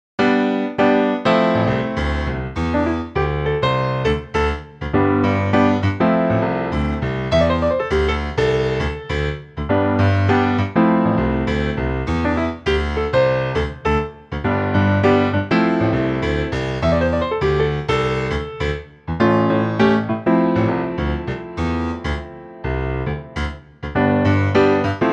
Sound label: C Piano; key - C - vocal range - F to A